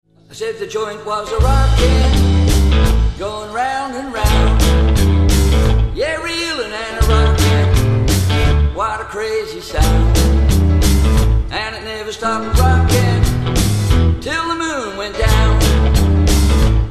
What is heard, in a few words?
Rim Shot